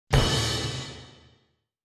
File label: cymbal